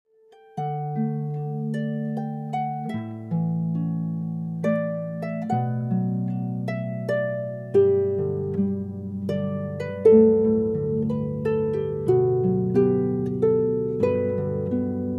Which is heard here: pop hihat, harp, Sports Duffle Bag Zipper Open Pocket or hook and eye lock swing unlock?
harp